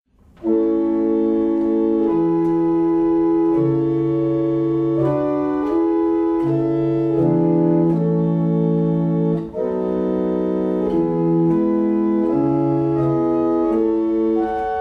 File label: barrel organ; Lillington